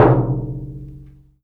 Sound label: bomb attach, halloween; Garry's Mod; tf2